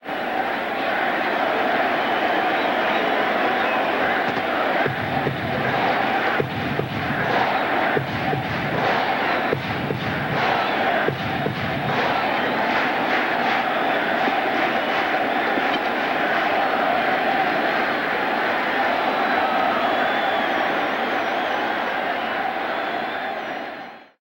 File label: Baseball Crowd Claps; Crowds